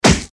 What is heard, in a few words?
eastern fist hit seq